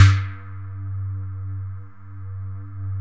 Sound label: BOUNCE; Masterbits; Vintage Voltage; SQ+FX